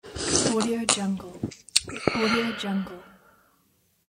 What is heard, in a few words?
drink